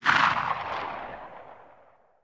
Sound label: echo dense